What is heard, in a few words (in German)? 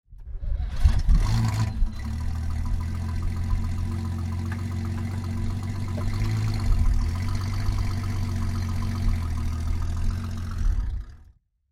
BMW 303 starten; von 1933